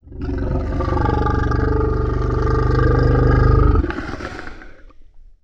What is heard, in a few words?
Throaty & Deep, Vocals - Monster; Sony Pictures Sound Effects Series